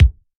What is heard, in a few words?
short kick drum sound c sharp key; kick drum samples